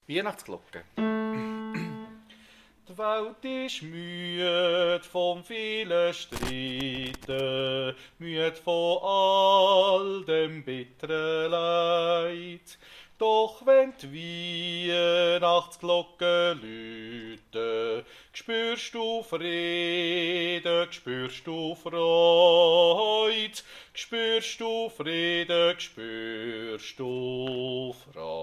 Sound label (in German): Bass